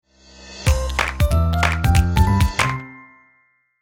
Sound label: success; puzzleweave